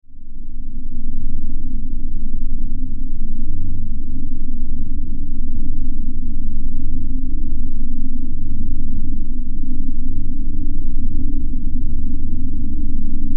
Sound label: Energy Source Pulsin, spaceship fx